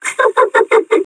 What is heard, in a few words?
chuckle, metropolice; npc